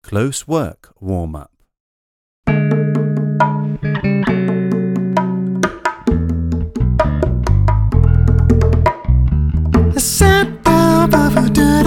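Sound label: Closework warm up, Tap; Ballet, Tap, Jazz Dance and Pilates for adults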